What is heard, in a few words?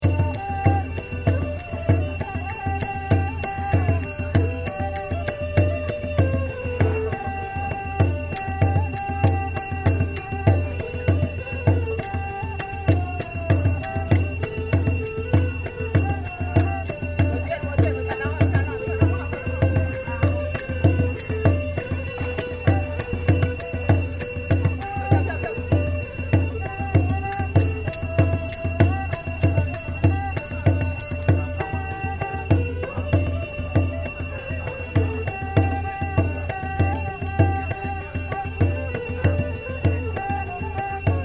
sunset band, cambodia